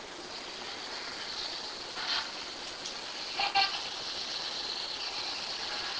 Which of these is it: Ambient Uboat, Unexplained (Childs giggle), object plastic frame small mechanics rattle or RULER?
Unexplained (Childs giggle)